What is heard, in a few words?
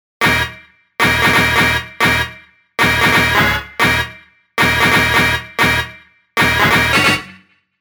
G# (134BPM)